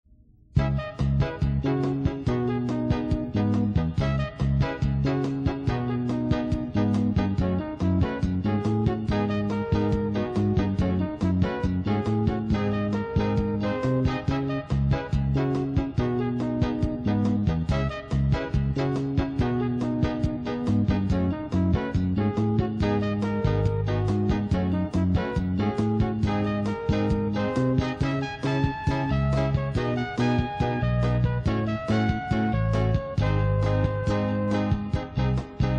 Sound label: Cool Jazz